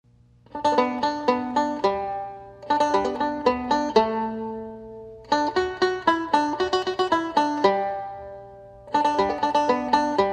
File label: curtain music